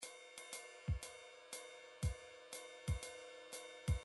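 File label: midi drum patterns drums jazz slow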